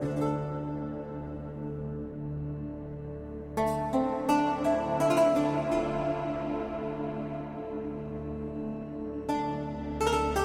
flame intro

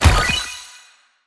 ui earn coin

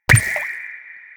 fish heal

